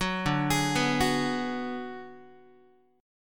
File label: D 6th Flat 5th D down Guitar, chord; D6b5 arpeggiated; JGuitar